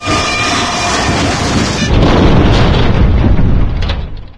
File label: dog destroy door, npc; Source Engine; video game